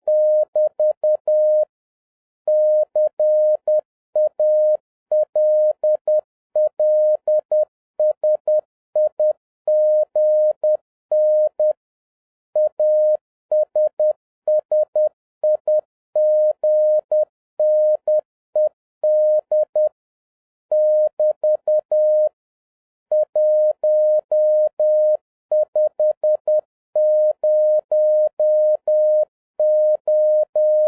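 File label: Morse code, 10 wpm; CALLSIGN ASSIGNED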